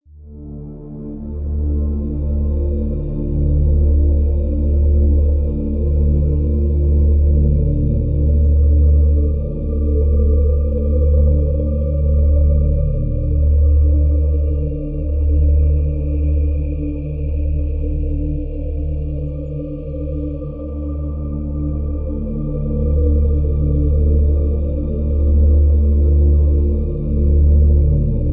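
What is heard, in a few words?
Drone Windy City (D); Brand X Music; Brand X Music Catalogue - Editor's Essentials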